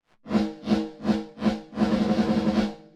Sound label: PhoenixVerb Early Attack 100 Tome 250ms Slope 30Hz